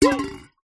bottle metal drink containing water bash against table; food drink; zapsplat